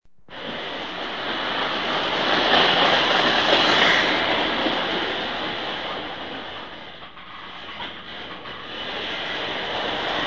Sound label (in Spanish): Tren juguete